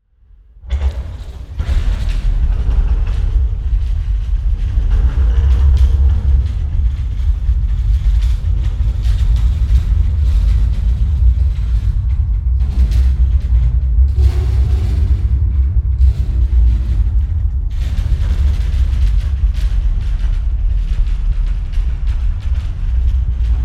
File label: metal, heavy creaks, shipwreck, ship scraping its broadside; Alexander Kopeikin; Black Metal